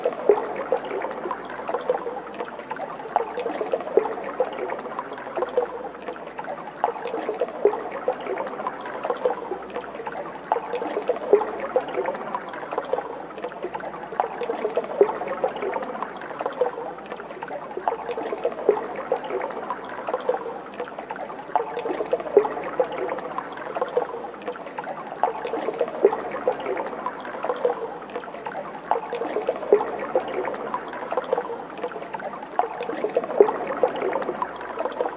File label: Poolrooms Ambience; backrooms 2d android ios online windows gamerip